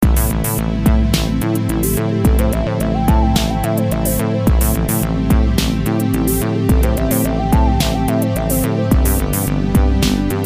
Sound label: dramatic 108bpm, loops